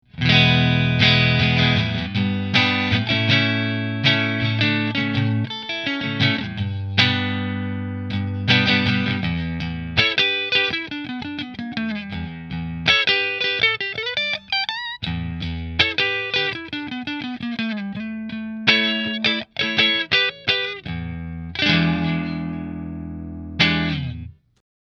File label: Rahan Position 3 Fender, guitars; Amber Burst